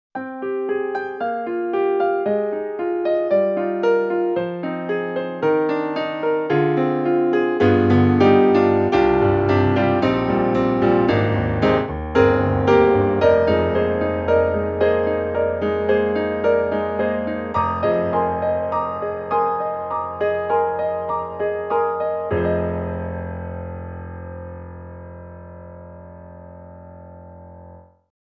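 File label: German Bright Grand, piano; KORG KROME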